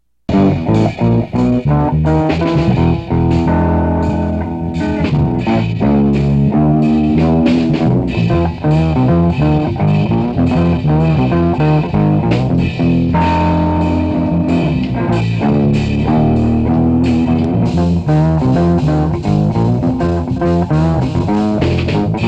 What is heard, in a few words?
Blues